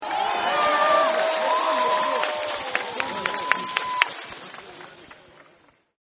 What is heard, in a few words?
Applause Woo-Oo-Woo; Human